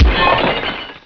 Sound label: Break Eggbeater; Snavely! 1.0.4